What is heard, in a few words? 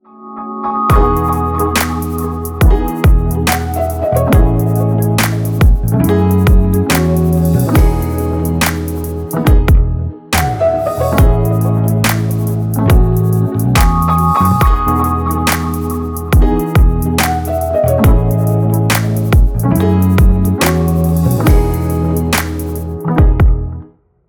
Chill